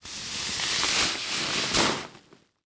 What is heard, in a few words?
Sports Stake Wood Stake Sliding Out Of Tent